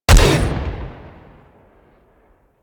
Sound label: Long Gun Shot; Warframe; Thano Tech Arch Long Gun